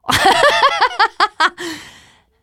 laughter, teen girl; player; survivor; voice

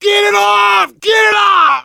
scream while pounced, biker; player; survivor; voice

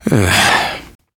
negative noise, survivor voice, biker

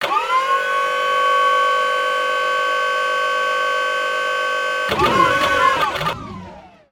forklift; machines

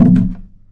plastic barrel impact soft; physics

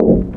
glass, footsteps infected run

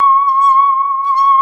saw danger, music, zombat

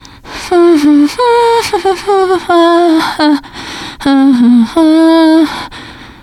nervous humming, teen girl; player; survivor; voice

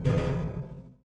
ladder, footsteps, run; boomer

